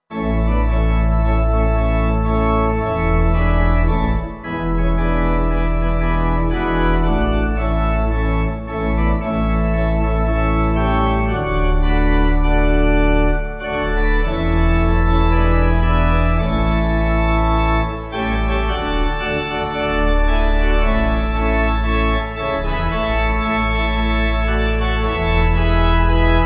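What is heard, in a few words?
Pipe, Pipe Organ backing; I Will Sing; Belden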